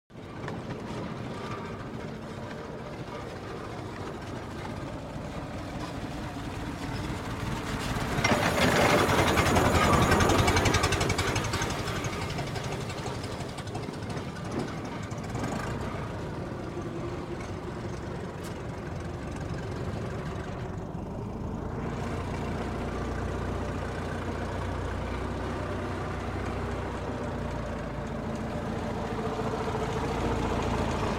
Model T in by & circles, Cars

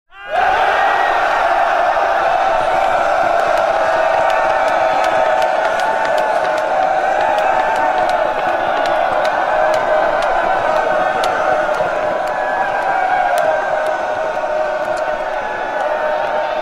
Infantry Roars 1000 Men Roar; Historical; Hollywood Edge Historical Series; War Horses Armies & Weapons

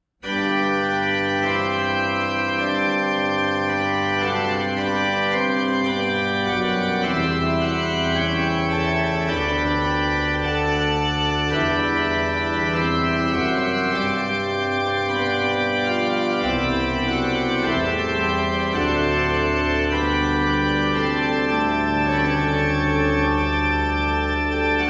Kyrie, Plein Jeu

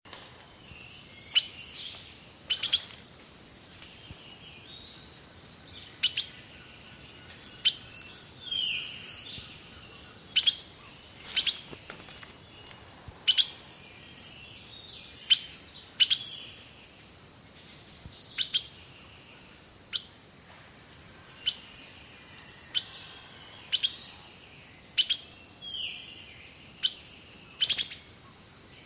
Tropical Rainforests

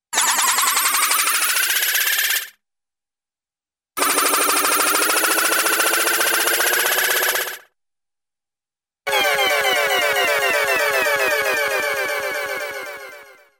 Multiple Ascending Tones (ie Ufo); Hollywood Edge Cartoon Trax

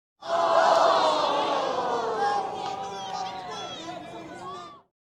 Medium Crowd Reacts Disappointed Ooh!

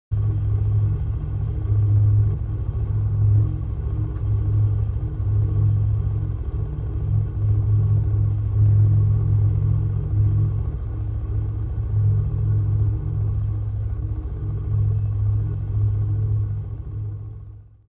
Machine Hum w Very Low Frequency; Electronic Sci-fi

